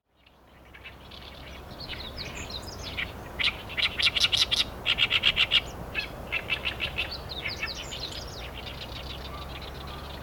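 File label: oyosikiri, great reed warbler; bird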